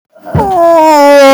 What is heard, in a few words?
uhhh